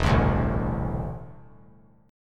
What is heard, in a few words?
E 7th Suspended 4th Sharp 5th; E7sus4#5 strummed; 4,7,5,7; JGuitar